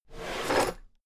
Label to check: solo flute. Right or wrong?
Wrong